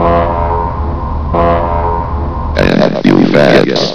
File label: notice; tfc